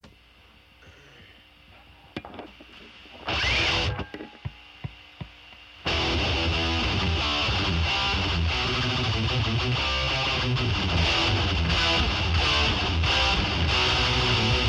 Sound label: Riff Tapes